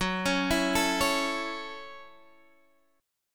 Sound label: Gb Minor 7th Gb Guitar Drop D; down; chord shape